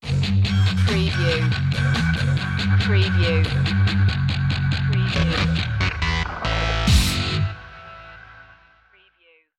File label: anvil stinger